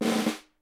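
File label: SNARE ROLL; Miroslav Vitous - Percussion & Harp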